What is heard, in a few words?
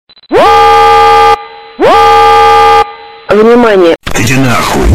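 pozharnaia trevoga, fire alarm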